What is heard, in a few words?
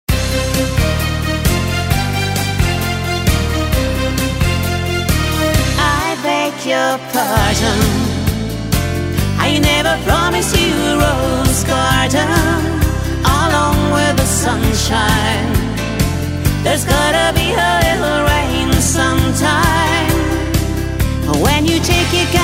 country parlor